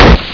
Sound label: mp5 shot